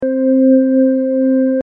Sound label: lightbulb Voltage Note C4; faradays law